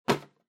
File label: Drawing Box Catch